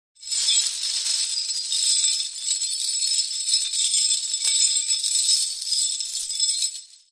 industrial chain small jangle

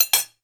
household knife down on plate; sound effects one

